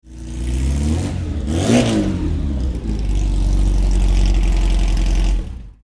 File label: Porsche 356B rev